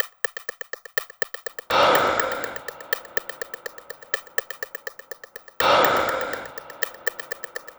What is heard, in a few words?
Breath Pattern Loop; Electronic Point Blank